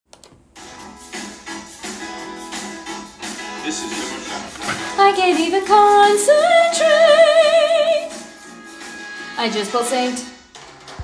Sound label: Bass; Fame The Musical - Holiday Pack